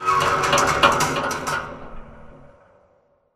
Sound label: metal stress med; Titanfall 2